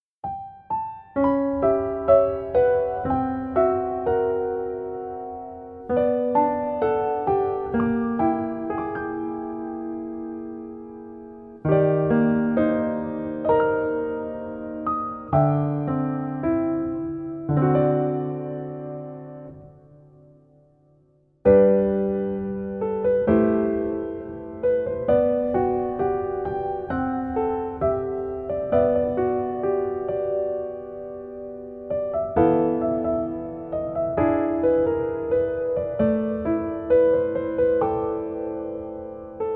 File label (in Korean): Piano ver 멜로디MR 여자키